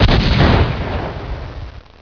BLAST; Meteor

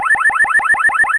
ALARM; Passion Pinball

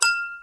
knock

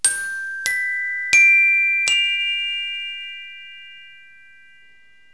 xylo, music

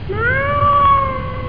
growl; Quake; PainKeep

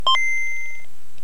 gameboy start

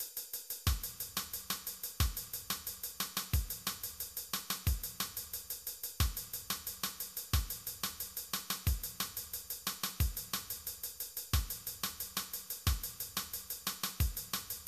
Reggae; Massive MIDI Collection

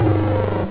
burp, synth chords

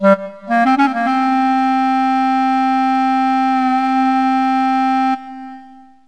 sax solo; music; Wired for Sound Pro CD; Aristosoft, Inc.

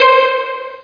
pizzicato C5; Star Trek JR Audio

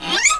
slide, dominoes; McDonaldland - Silly Games; McDonald's

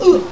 Karate; fightingspirit

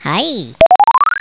bleeble

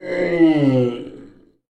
zombie; kult

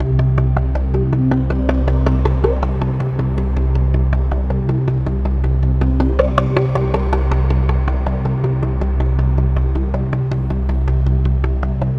AMBNT 160; Ambient

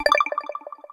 chain

